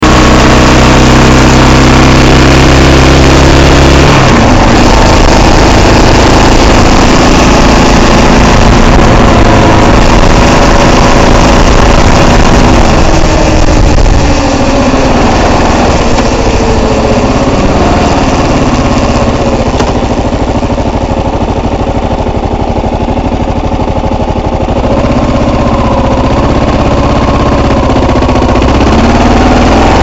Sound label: noise example, klr650; what is this noise